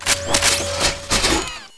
cart foley, weapons

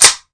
xm8 bolt forward, weapons

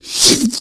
Smoker Tongue Hit

